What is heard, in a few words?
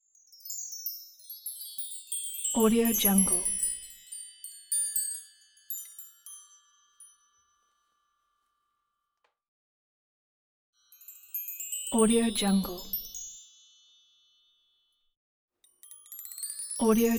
chimes